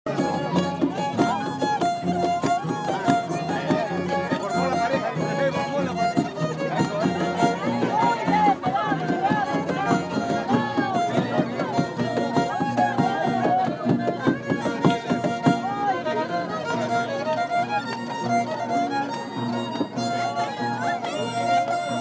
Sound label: fiddle player in the plaza; san martin